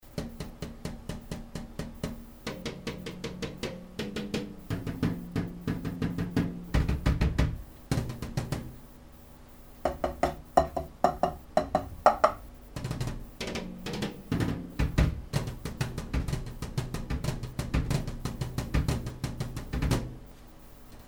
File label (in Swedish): Digitala Trummor